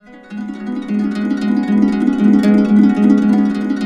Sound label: AB, Harps; Complete Harps; USB Soundscan vol.62; AKAI